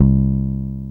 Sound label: D-BASS